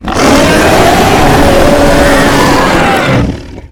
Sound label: roar; lagiacrus